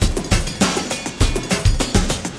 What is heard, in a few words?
LOOP, Drum & Bass, 100 BPM